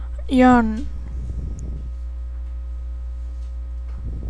yawn

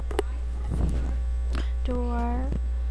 door

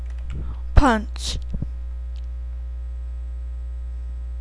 punch

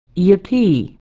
yippee

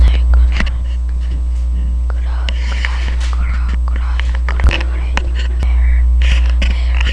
cry

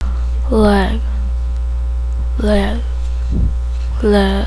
laugh

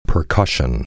percussion

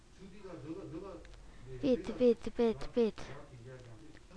beat

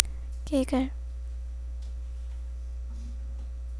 giggle

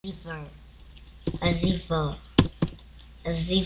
zipper

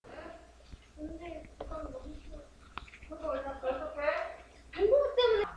breath

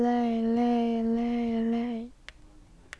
rain

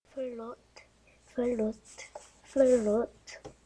flute

